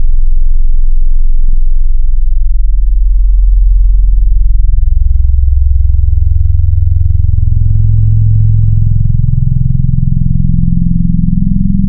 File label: Harmonic Morph; Digital; Synthetic's Serum Collection